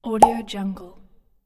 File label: pop